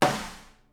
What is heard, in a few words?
SNARE, Live Snares